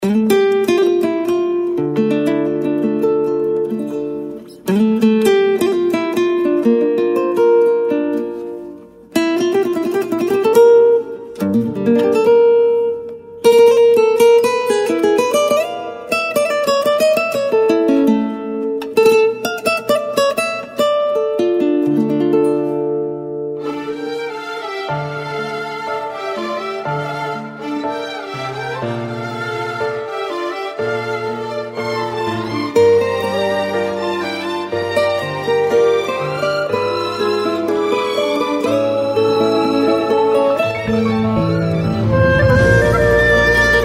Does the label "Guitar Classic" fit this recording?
Yes